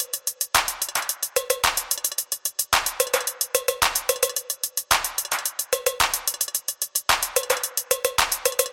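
TOP LOOPS, Organic House / Deep House Sample Pack Oriental & Middle Eastern; Azura – Organic House Sample Pack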